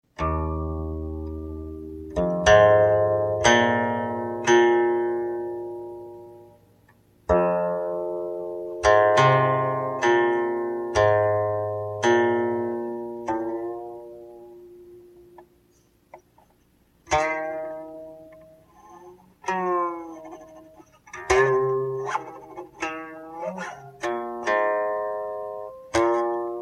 Chinese Guqin

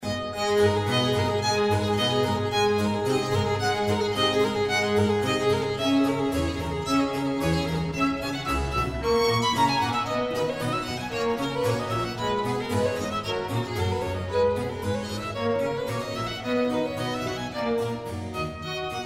Violin Concerto in A minor Allegro; Antonio Vivaldi; Op 3 No 6 from L'estro armonico

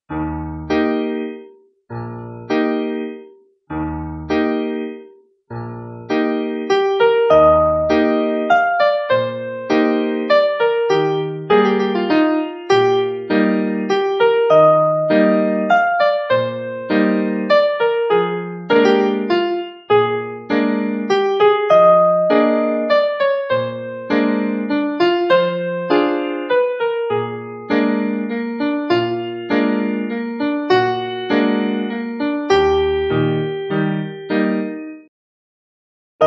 waltz

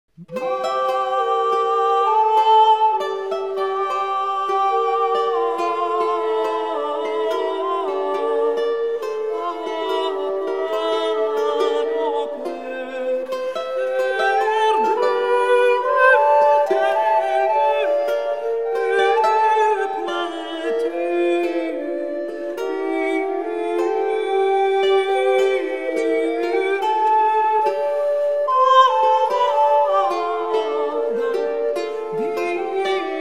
ballade; Philippus de Caserta; En remirant vo douce pourtraiture

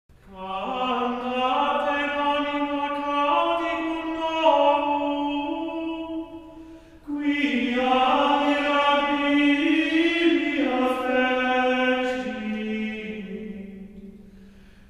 Gregorian chant Mass, Introit; Mass for Christmas Day

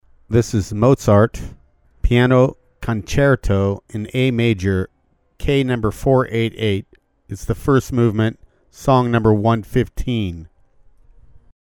Piano Concerto in A Major, first movement; Wolfgang Amadeus Mozart

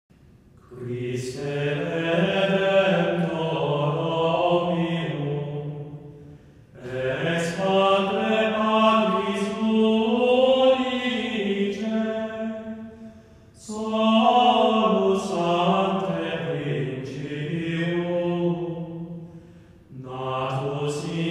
hymn in fauxbourdon; Guillaume Du Fay; Christe redemptor omnium, Ex patre, patris unice

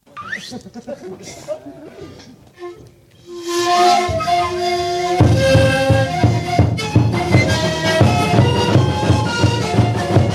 Peruvian Sikuri Ensemble

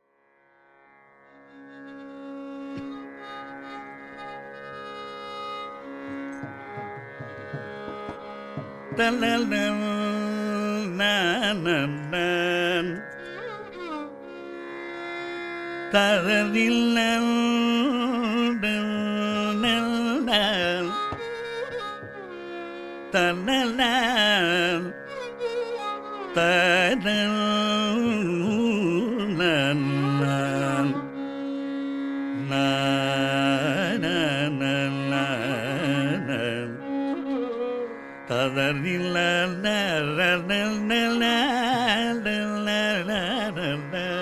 Carnatic Classical Singing

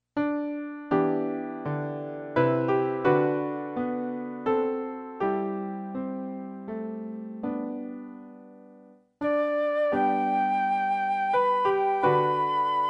G, high, piano acc.; Amazing Grace

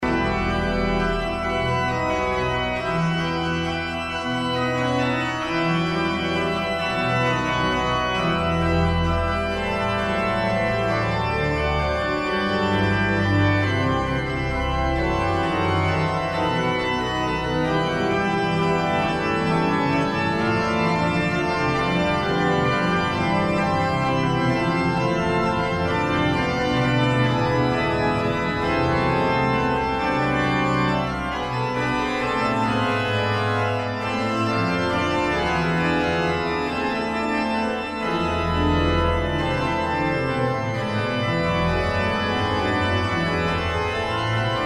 Prelude and Fugue in A minor; J. S. Bach

